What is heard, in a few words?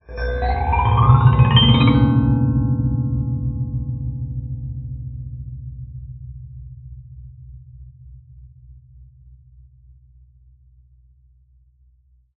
GLISS; Xsample VOL-1; 90 s Sample CDs